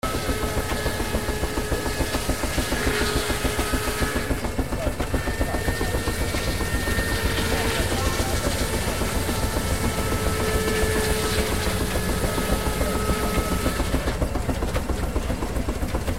steampunk machinery and saws

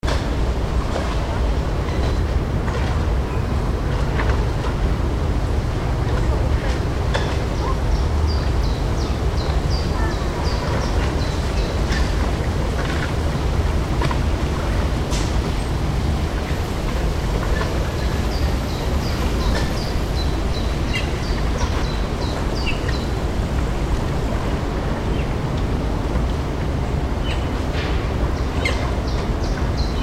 atmo germany berlin tiergarten pond

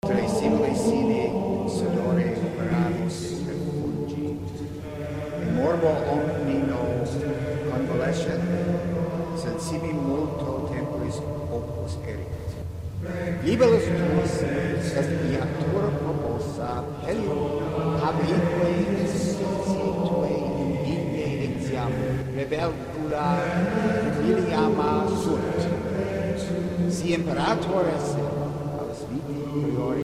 religious service and chant

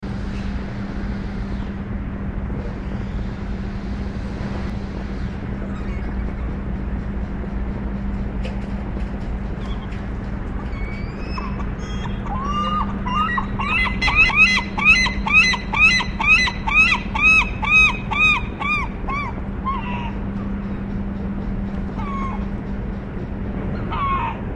harbor at the docks

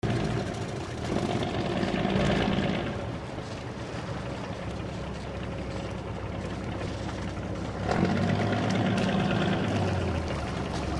atmo harbor docks boat

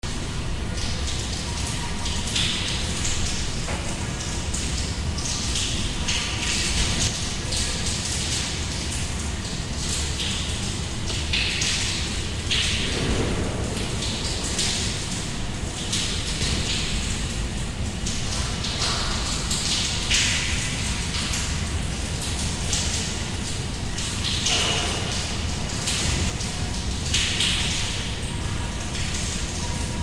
atmo underworld cavern drips

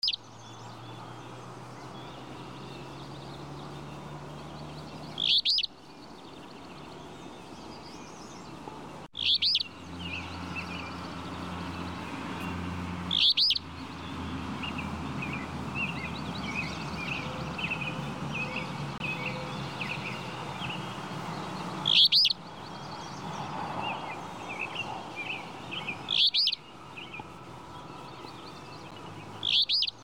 atmo birds flycatcher

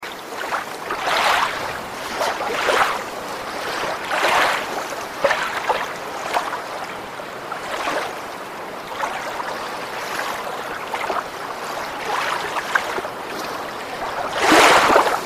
waves lake calm waves

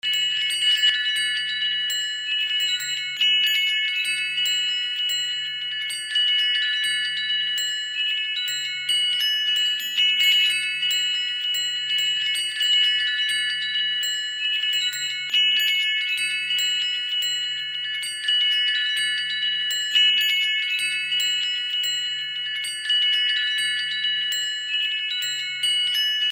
atmo scifi crystals singing

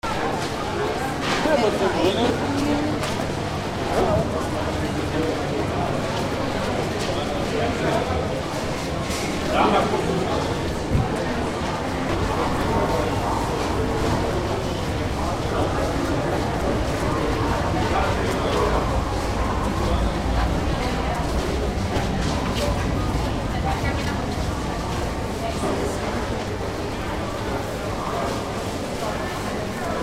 station; berlin alexanderplatz

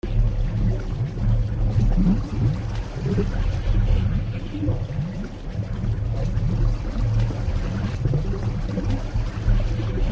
atmo steampunk gurgling machinery

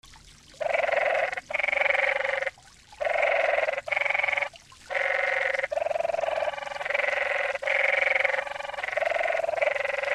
frogs pond; az sedona

